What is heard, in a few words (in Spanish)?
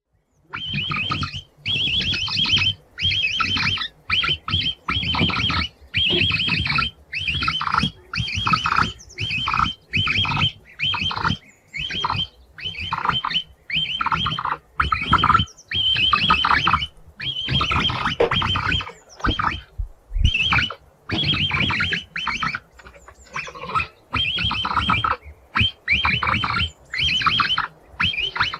SONIDO DE CHIGUIRO; el chiguiro vanidoso